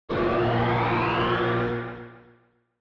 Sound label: Retro Anime Mech Equip Short